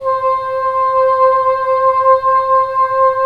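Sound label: wind bassoon; LA Composer vol. 3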